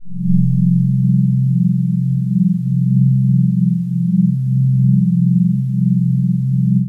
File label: Bpm139 Pad C, Dubstep Chord Loops; Drained